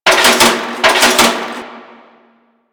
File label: Shipyards Conveyor Loop; Ambience; Props; Warframe; Grineer Shipyards